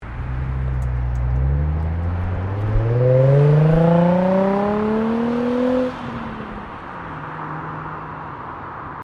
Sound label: CAR NISSAN 350Z EXHAUST DRIVE OFF, manual sportscar; Cars (Sound Effects:Vehicles)